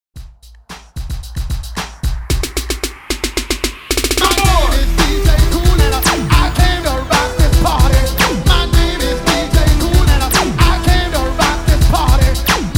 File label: Party Break 112 BPM